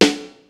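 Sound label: HIPHOP KIT, DRUMS; Kid Nepro; Elite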